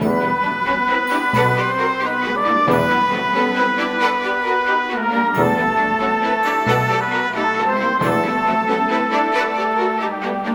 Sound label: Cinematic Orchestra; Sonic Foundry (Sony Creative Software); Orchestral Series VOL-3 - Cinematic